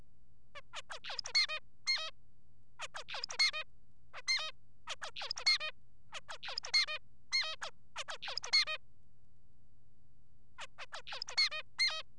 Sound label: song